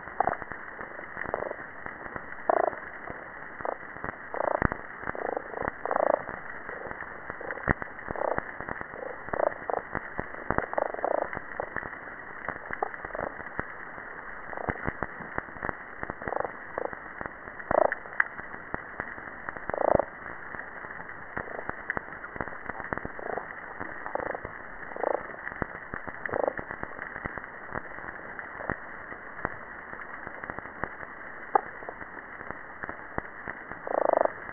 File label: Damselfish, American Samoa Hydrophone